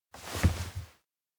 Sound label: shy turn; anemoi